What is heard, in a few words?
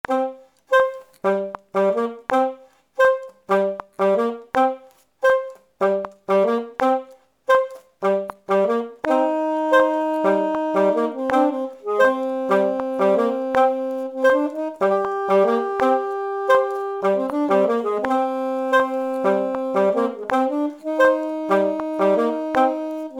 Tutti